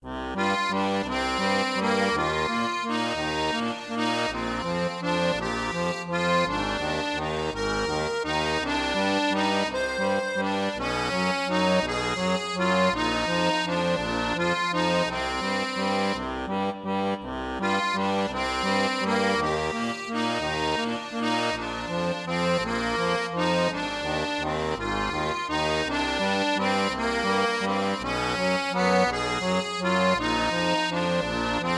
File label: tavern loop; paris-tavern-loop2; curious expedition 2 macos ps4 switch windows xbox one xbox series xs gamerip 2021